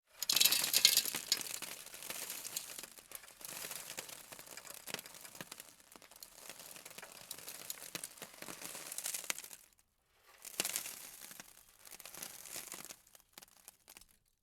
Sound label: glass shards pouring onto metal